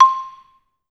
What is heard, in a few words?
MR LONG C6, Advanced Orchestra; Best Service